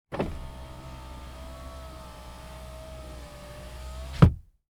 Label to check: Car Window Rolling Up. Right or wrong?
Right